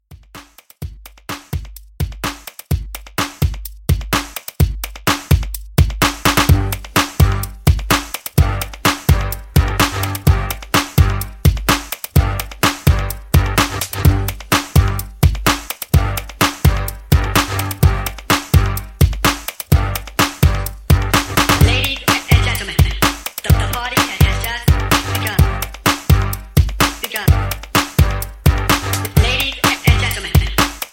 90s Dance Freestyle

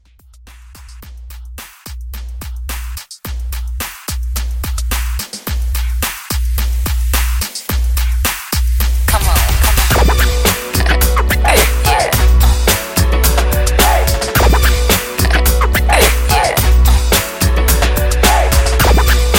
RB w Scratch Redrum 108 Bpm; DJ YAN

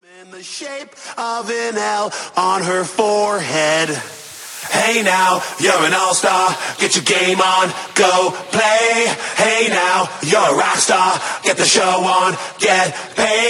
Mashup Transition 105 100 BPM

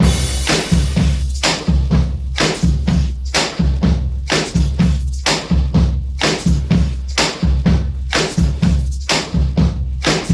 90s Upbeat Redrum; Remixfreakz

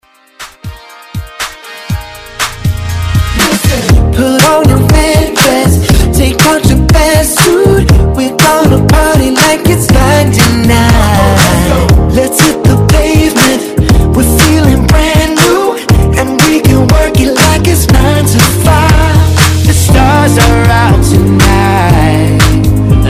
Xmas Redrum

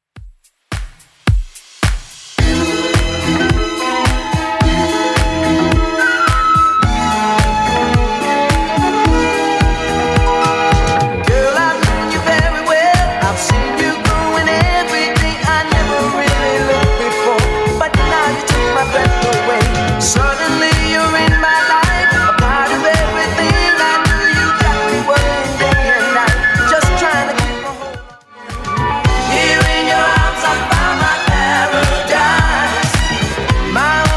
70s Dance Redrum, 108 bpm

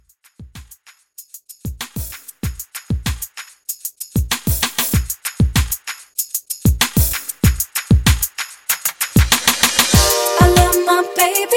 RB Redrum 96 Bpm

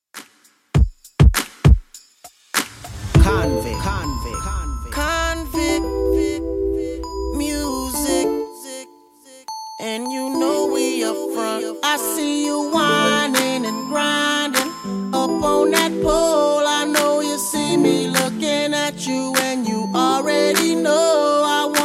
2ks DJ Friendly 100bpm CLEAN

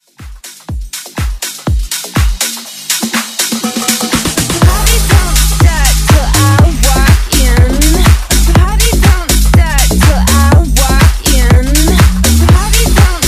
House Redrum

